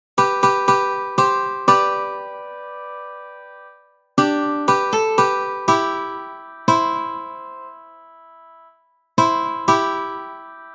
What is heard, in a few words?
uke